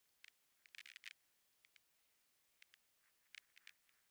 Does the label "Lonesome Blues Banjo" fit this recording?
No